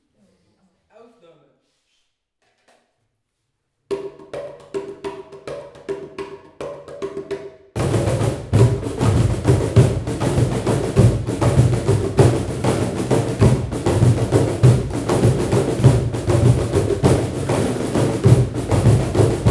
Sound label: Samba